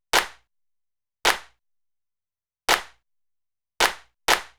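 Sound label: Hip Hop Clap, 094 bpm; Digidesign; Pro Tools; Pro Tools Essential Loops; Percussion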